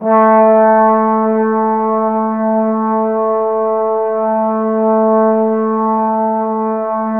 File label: Trombones, A3; Standard Instr